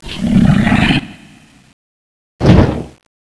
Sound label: fly; boss Angra